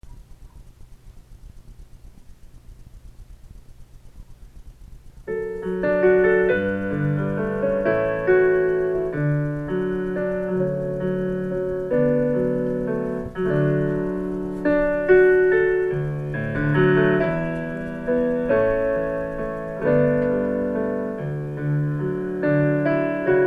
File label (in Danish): akkompagnement sab